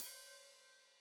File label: Hat Foot Open, Hi-Hat; Deeply Sampled Drums